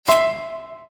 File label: Accepted!; Cookie Jam